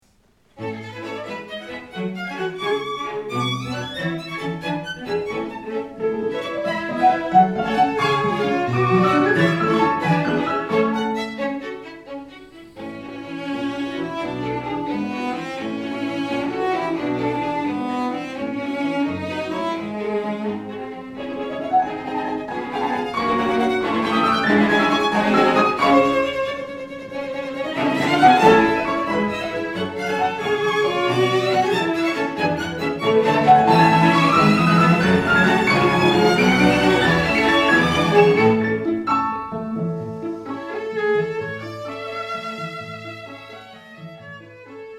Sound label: Piano Quintet; 4th mov